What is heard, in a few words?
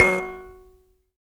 Buzz Kalimba; Roland LCDP11 Africa VOL-1; Buzz & HiBz